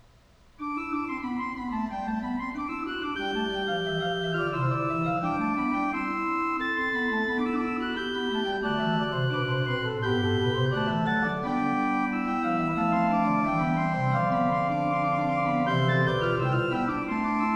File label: Fugue, Organ